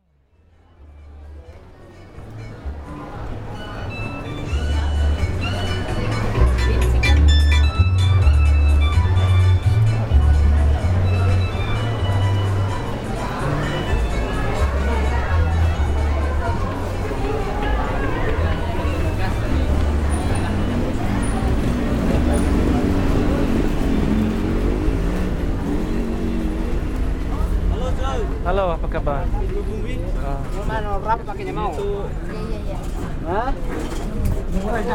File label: Daytime Street Sounds; Blok M; Atmos